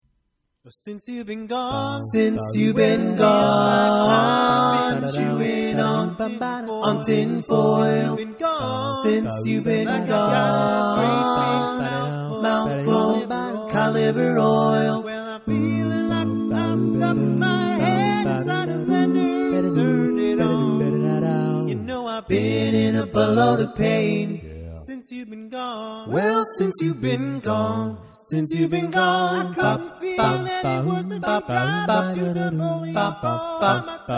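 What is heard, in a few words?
barbershop tags, baritone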